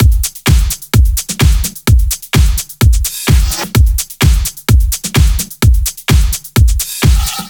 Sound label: 128BPM Samba Kit; VFH1 128BPM E Samba Kit